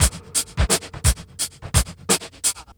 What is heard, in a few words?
08 TRIBE VIBE 86 bpm, Loops Breaks; POTBreaks